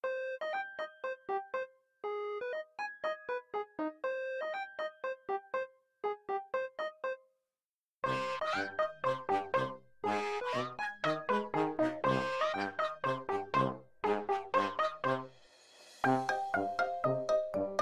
Ingame Music; Bucketz

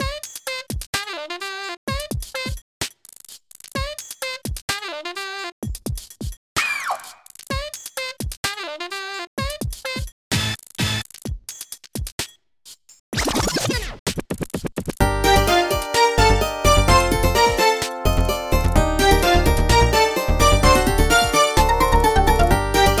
Hip-Hop Stage; pinky street kira kira music night pop town gamerip

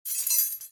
key pickup; Devour

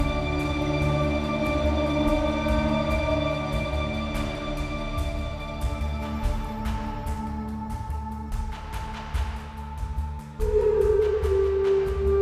Snowstorm; Assassin's Creed III

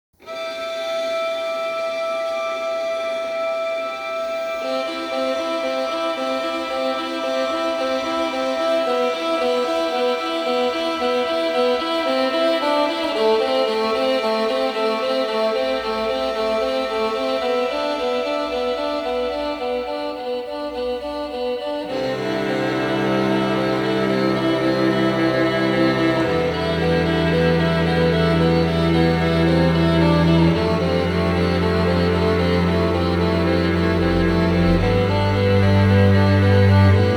Whale. Shark., String Quartet; Rogue Legacy 2; A Shell in the Pit

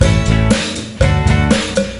Cowbell; Happy Feet Two; NDS

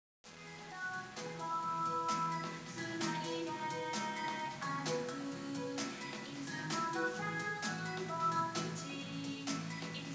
bgm karaoke; usen; yakuza ryu ga gotoku kiwami 2 pc gamerip

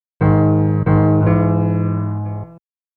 Piano

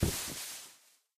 Feather Crack; Doodle Jump 2; Android

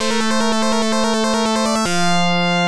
Game Clear; puzzle action tant r; genesis

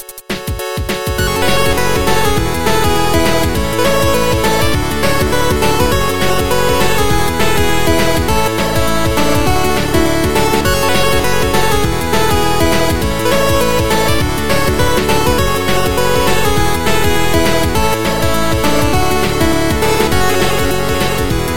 Groove; Infinitode 2

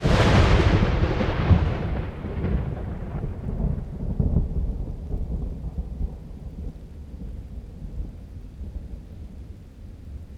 environment lightning

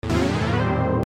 fire; End of Aspiration; android

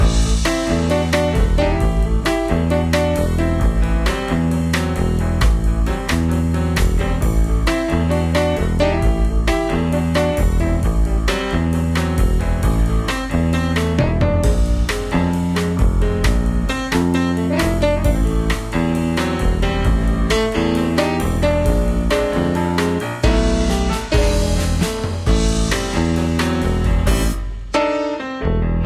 Outro Jazz Loop; Quest Rookie; club penguin island; android

